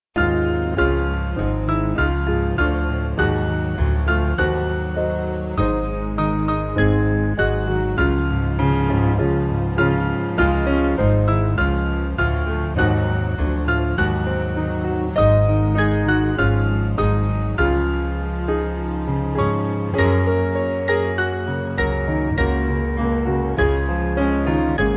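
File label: Band, Free Music Backing Files for Churches; There Is A Place; McAfee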